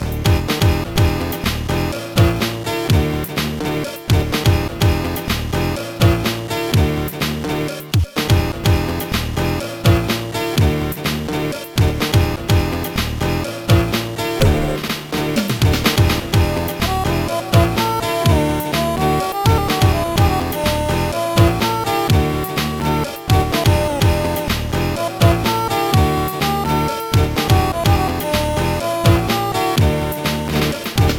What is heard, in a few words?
piano impression; Dagon; Trackers; Modland